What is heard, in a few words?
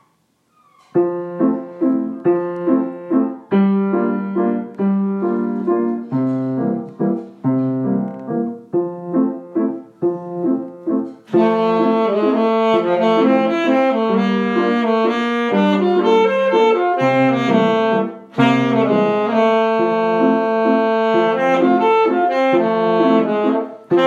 Waltz Sax with piano